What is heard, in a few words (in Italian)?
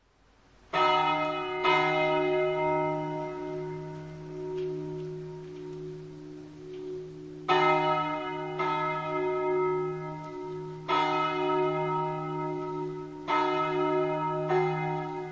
campane; san daniele